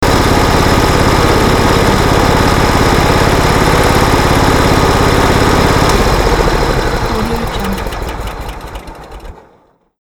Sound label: small engine